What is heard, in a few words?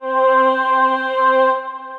Voices Layered Clean, String Machines, Roland VP-330; Ableton; Factory Packs; Retro Synths